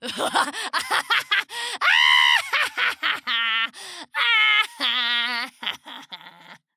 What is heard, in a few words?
matriarch laugh